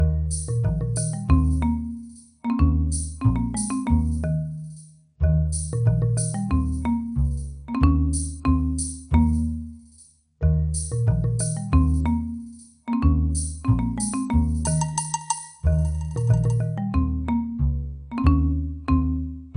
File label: Fantasy Level; sim theme park; theme park world